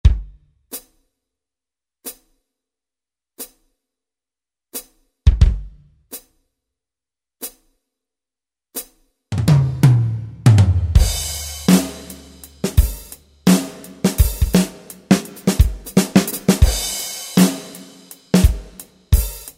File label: Standard Kit 1, Yamaha PSR-3000 drum kit; Factory Voices; Perc. & Drum Kit